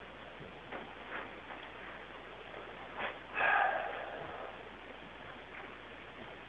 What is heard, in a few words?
loud sigh; smythe residence two